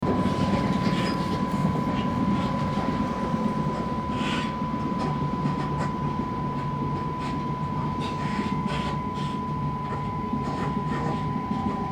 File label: Squeak Electr Train INT